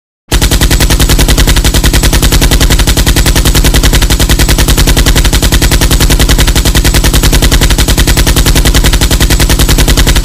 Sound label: weapon